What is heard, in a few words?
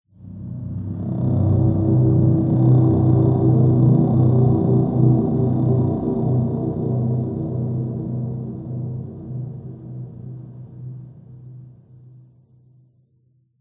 Roar Low; Interiortwo